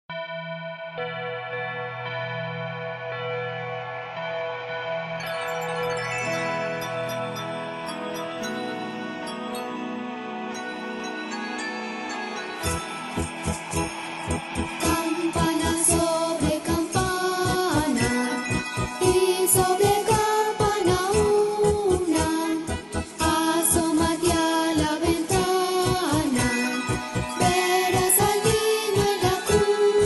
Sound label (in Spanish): Campana sobre campana letra